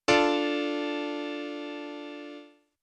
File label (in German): Akkord; theorie